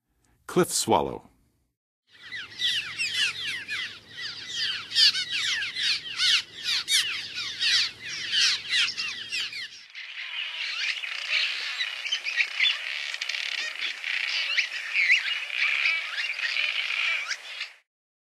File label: Cliff Swallow, Songs of Birds; North America; Western USA; Western USA Songbirds